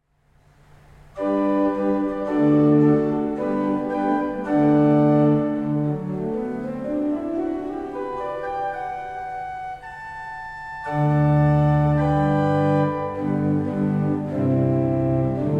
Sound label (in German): Orgel, pfeifenorgeln; HW-Prinzipal 8'